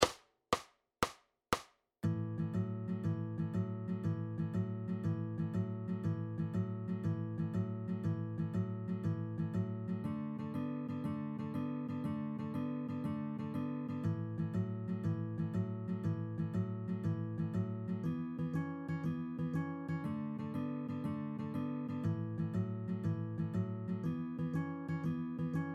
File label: 12 Bar Blues in A 5s and 6s only shuffle 120 bpm